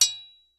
sword hit

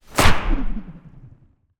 warship attack stake; ships siege

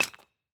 mine stone

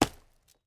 lumber tree

